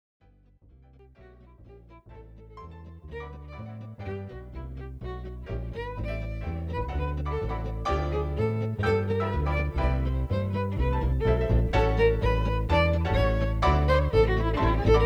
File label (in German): instrumental